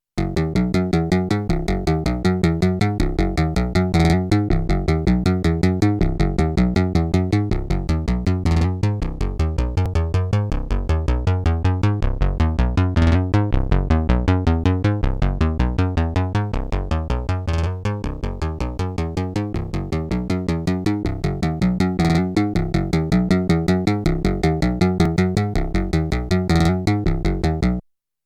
ratchet; Patches

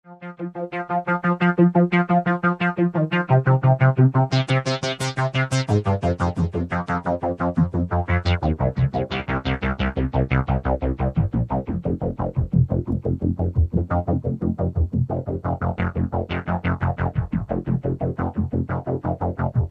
xpander sequence; xpantastic

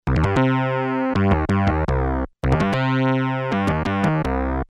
guitar, CZ-101

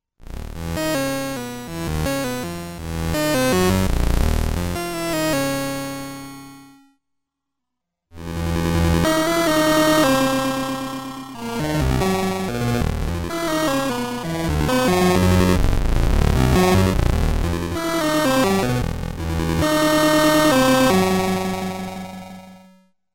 Glitch Not Walsh; cgs synth

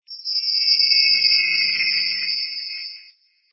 Banshee Scream; Modular Sound-FX; David Ryle's Goofy FX sounds

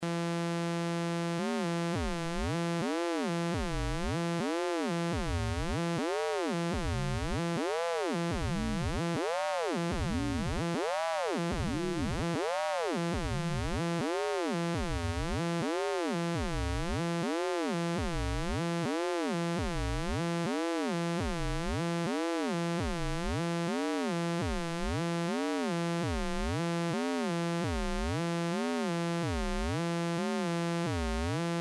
Modcan Linear Quad Mod, Frequency Shifter; Linear Thru0; Frequency Shifter Samples

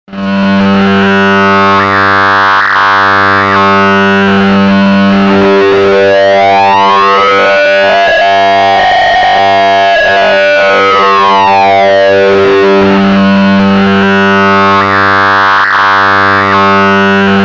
A124 VCO sync; Doepfer

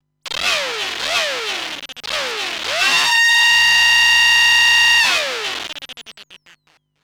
metal drill, self oscillate; analogue systems

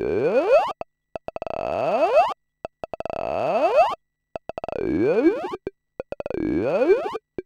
Talking Serge; SergeModular

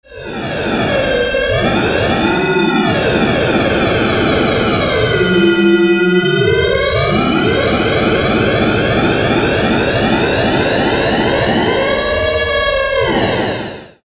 SciFi; buchla 200; buchla modular

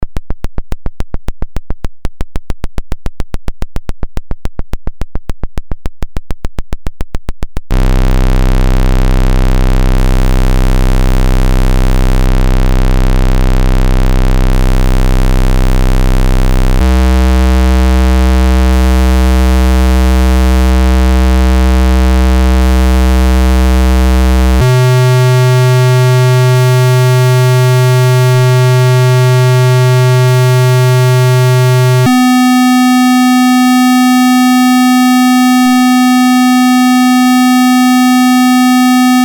pulse mod A B

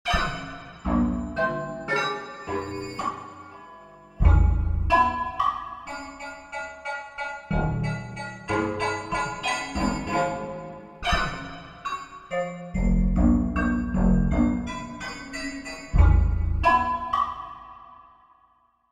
Buchla Gagaku

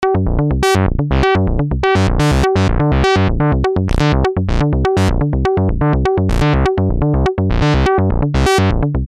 Buchla 200 S&H Bass; buchla modular

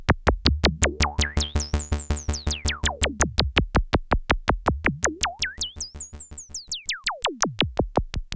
4pole sweep; SergeModular

